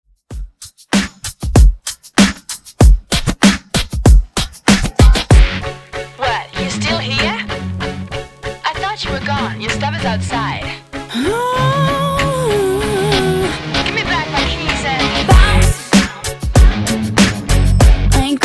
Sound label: Bounce Redrum